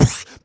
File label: Beatbox Snare, Human Beatbox